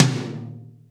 TOM, Drums; Best Service; Real Mega Drums VOL-1

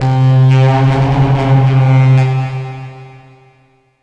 LEAD Mango Dronesystem; Polyend; Plughugger

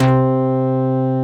SMACK BASS

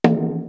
DJUN 3 SMALL; East West; Global Sounds

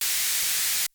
MG1 KIT, MOOG; OLD SYNTHS; Rubber Chicken Software Sample Bank

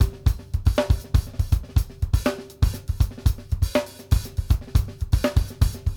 6-8 GROOVE, 240; Sampleheads; New York City Drumworks VOL-1

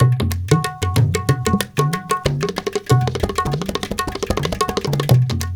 India, Heart of Asia; Spectrasonics - Heart of Asia CD2

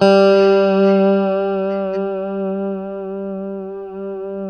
G 3, strat, JC120; Spectrasonics; Hans Zimmer Guitars VOL-1; JC-STRAT MF

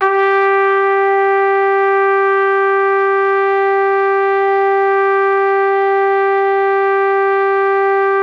G3, Pop Brass; Best Service ProSamples vol.19; AKAI

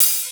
Hats and cyms, Funky Drums, Kit hits

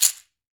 CAXIXI, Latin Shakers

